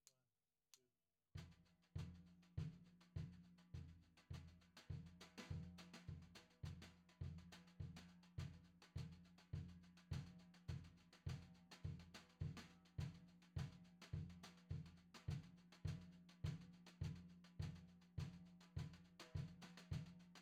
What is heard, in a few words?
Floor